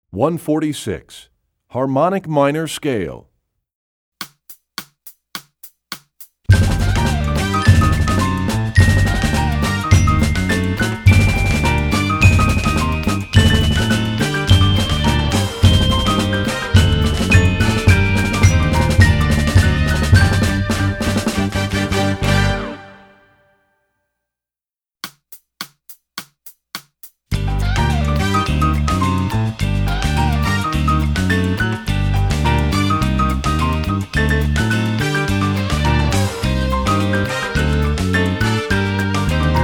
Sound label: Harmonic Minor Scale, Percussion; EE2000 Book2